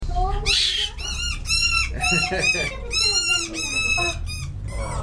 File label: Screeching Fennec Fox